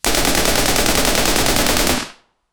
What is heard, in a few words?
loud realistic firearm, shotgun